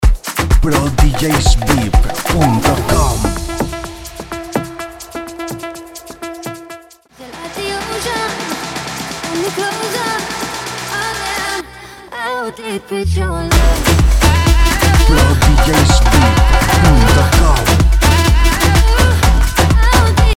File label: 126 AFRO